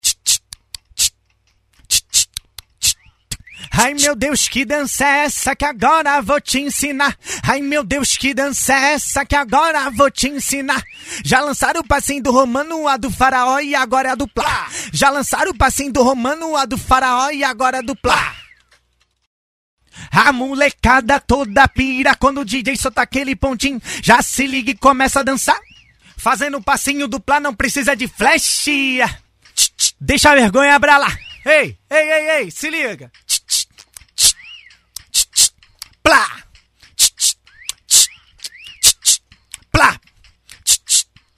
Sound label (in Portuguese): acapella passinho